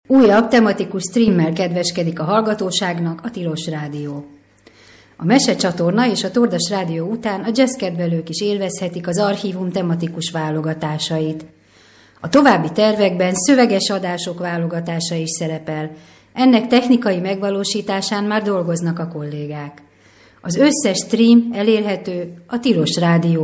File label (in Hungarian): tilos jazz stream; kozerdeku